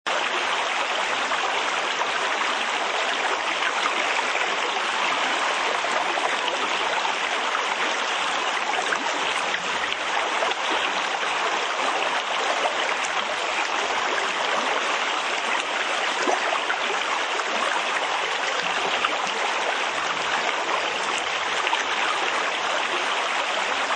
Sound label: Brook High, Constant rushing of a country brook; Brook High Pitch 1; Rivers; Nature